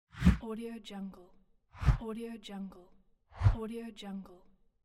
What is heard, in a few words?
whoosh transition